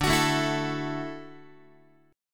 D-Major Add 9th, D, Guitar, 4A, 4E, 5A, 5D, 5G sharp, 6C sharp; strummed